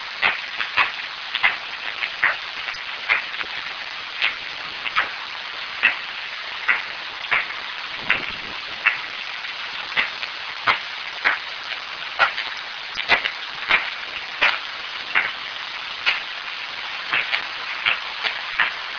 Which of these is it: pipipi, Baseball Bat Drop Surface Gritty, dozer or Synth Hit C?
pipipi